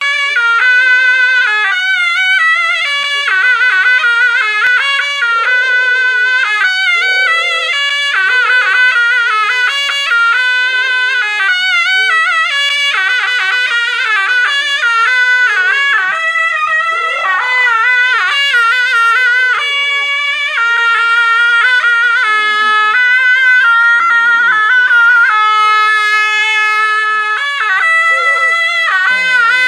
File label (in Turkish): Efsane zurna zil sesi